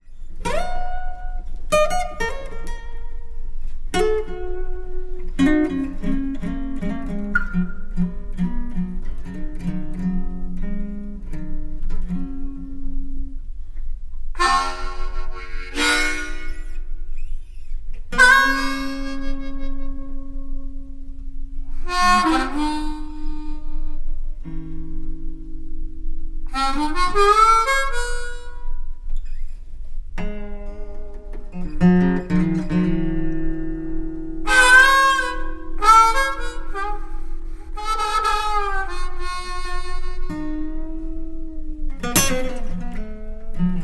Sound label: Lazy Day Blues, harmonica blues